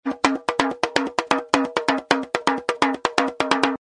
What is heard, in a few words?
tambourine tribal rhythm